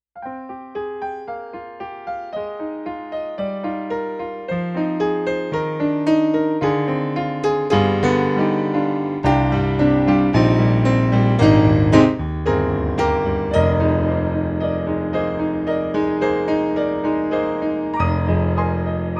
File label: YAMAHA P120